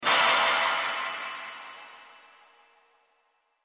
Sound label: Magical Burst, Magical Burst Twinkle; Cartoon